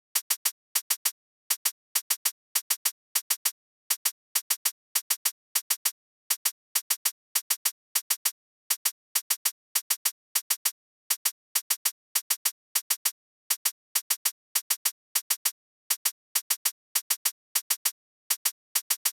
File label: Drum Loop - 100 BPM Hihat; Midtempo; Carnage; Cymatics; ANALOG EVOLUTION Beta